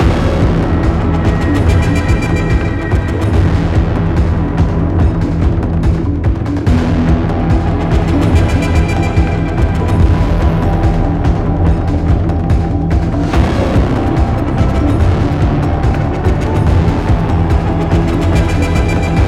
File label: High Combat; Fortnite Save the World